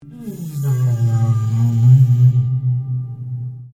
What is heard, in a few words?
Piano Upright Broken String Slide Down Rubber; Upright piano without keyboard; Pianos; Musical